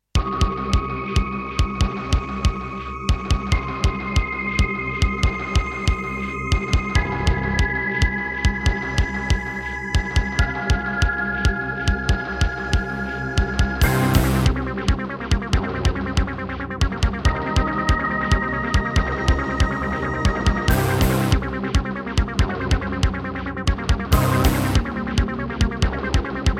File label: Roland CM-300